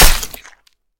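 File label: palpable powerful dagger strike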